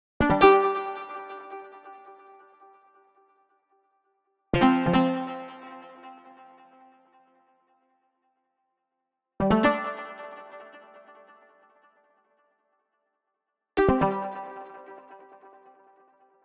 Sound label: Arcade Jingle Neutral